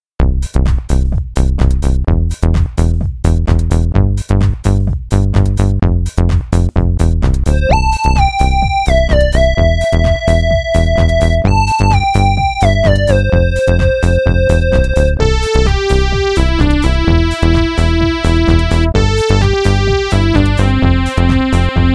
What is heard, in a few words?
lead